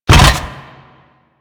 Land Metal, Footsteps; Warframe; Grineer

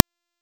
Thorn Bow Projectile; Weapons; Player; Bows; Fey Thorn Bow

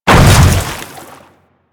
Hammer Boss Land Water, Footsteps; Warframe; Grineer

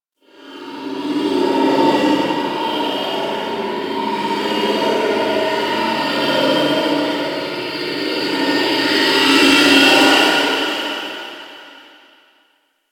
Ice Distant Robotics; Ambience; Warframe; Corpus Ice Planet

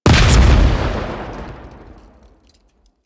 Primary Barrell Explosion, Weapons; Warframe; SU Modular Guns

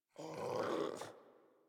Puppy Sleep Idle Snore; Enemies; Kubrow; Warframe

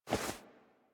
Movement Foley; Warframe; Wisp

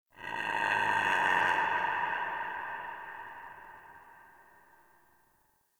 Creature Distant Low; Warframe; Infested Eris; Ambience; Outdoor

